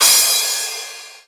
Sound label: CRASH